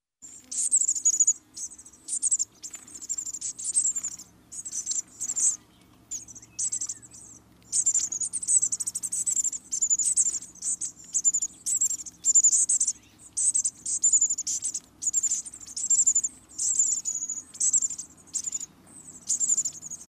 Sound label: bushtit